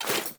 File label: Foley Action Default Melee Swipe; Characters; Player